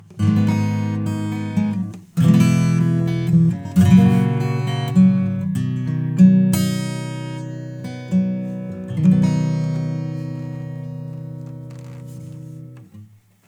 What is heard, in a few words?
Acoustic Guitar; Neutron 4; Speed 100